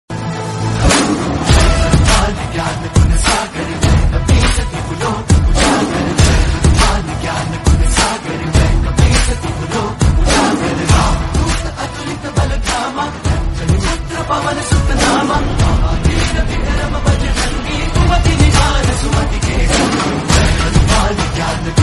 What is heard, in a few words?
Hanuman Chalisa dj remix ringtone; hanuman jayanti special